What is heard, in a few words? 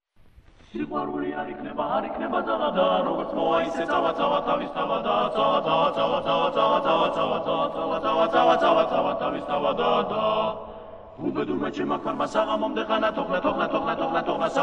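Imeretian humorous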